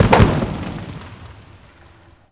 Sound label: medium impact generic; WAR